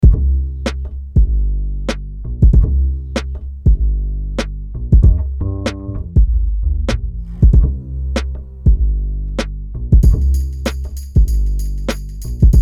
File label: local library music